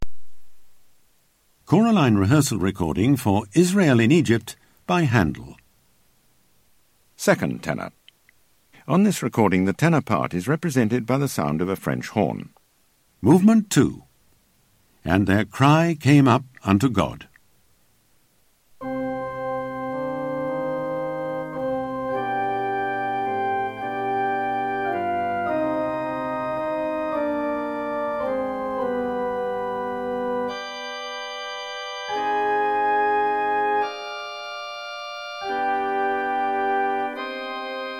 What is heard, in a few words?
Tenor 2; Rehearsal; Handel Israel in Egypt; ChoraLine